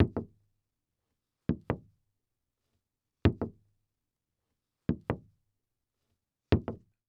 Foley Footstep Cowboy Boots Slow Walking On Solid Wood Platform; Foley Footsteps